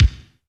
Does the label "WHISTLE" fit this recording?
No